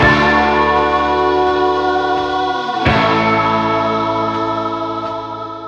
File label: voice and keys, Loops; Phonky